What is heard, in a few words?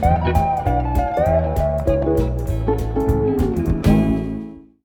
stinger; SpongeBob Patty Pursuit; grass shack